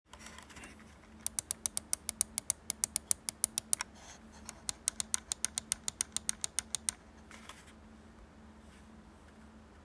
Daumentasten

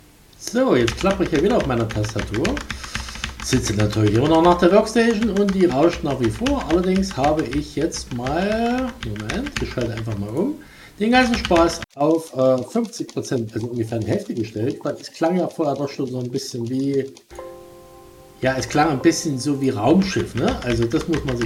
Tastatur

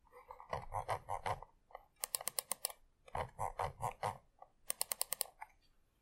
Mausrad scrollen und klicken

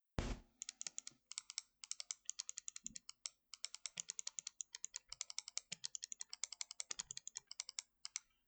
linke rechte maustaste

